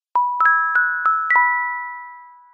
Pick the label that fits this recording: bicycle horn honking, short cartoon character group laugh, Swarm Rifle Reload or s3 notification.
s3 notification